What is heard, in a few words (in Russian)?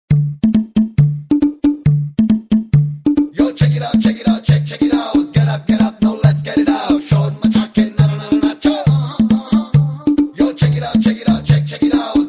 afrikanskaya muzika